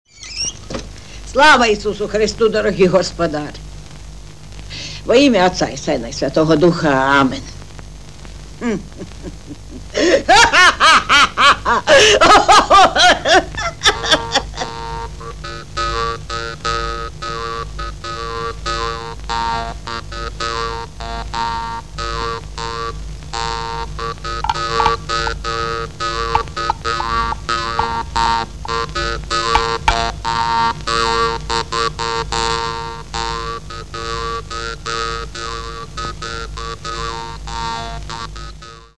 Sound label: drymba; ukr kino